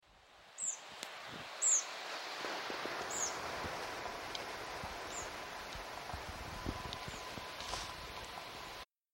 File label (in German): waldpieper